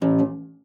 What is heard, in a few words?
STOP G#1, harp; Xsample VOL-8; HARP+FX